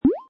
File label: Bubble, Button sound or menu sound; Multimedia